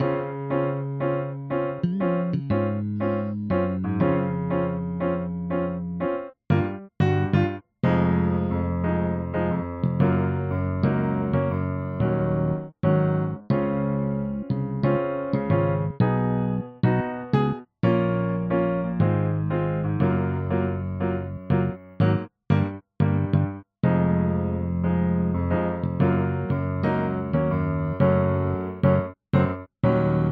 last bass

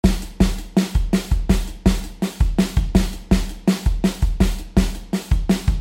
drum

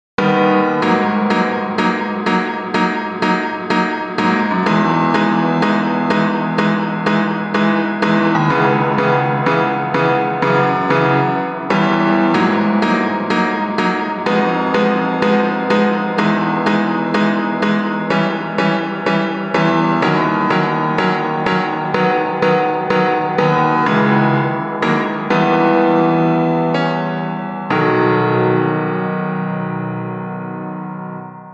piano wet big

